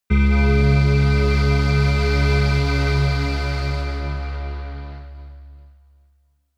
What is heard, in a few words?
synth sway deep